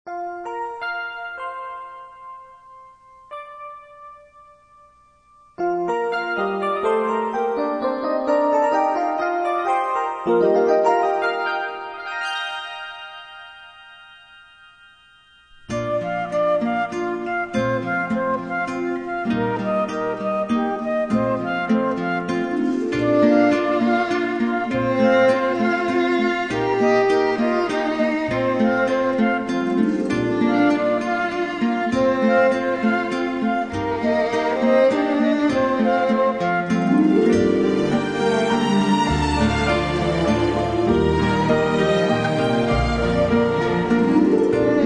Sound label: Orchestra, Strings